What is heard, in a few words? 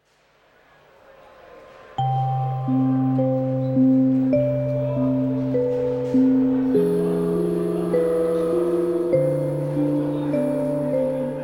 Bells